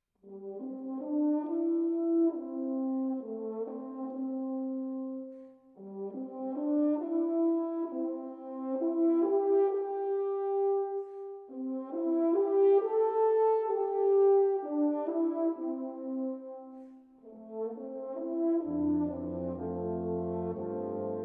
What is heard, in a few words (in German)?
Waldhorn Tuba Quartett